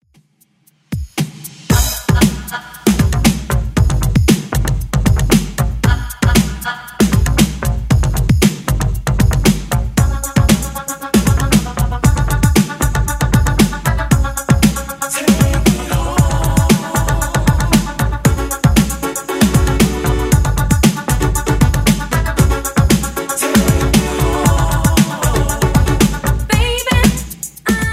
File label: 80s Redrum 116 bpm